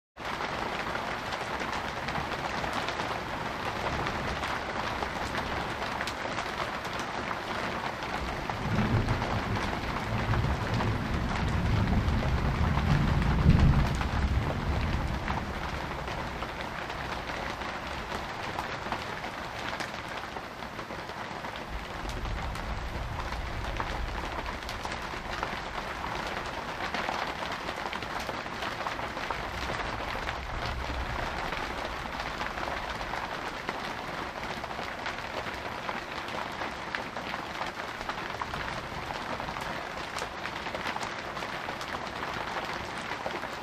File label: Medium Hard Rain On, int